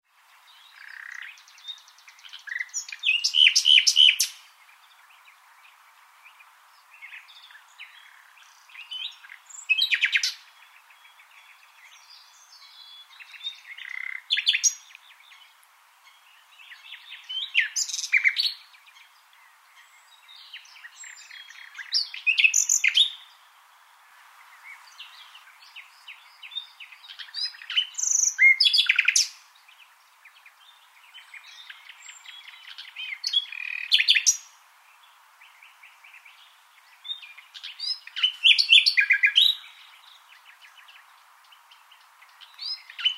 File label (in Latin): Luscinia megarhynchos; SETE